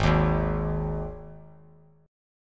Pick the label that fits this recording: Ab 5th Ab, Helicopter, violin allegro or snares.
Ab 5th Ab